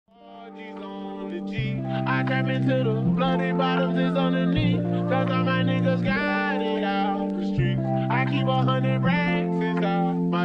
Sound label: Lofi